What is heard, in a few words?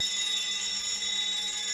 Alert Bell